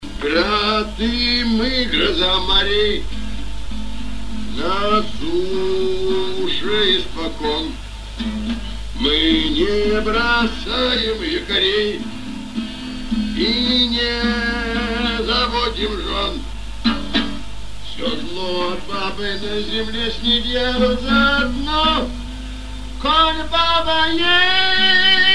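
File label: Pirati gitara, guitar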